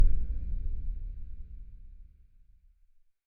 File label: City SFX, CROWD SURROUND Light Off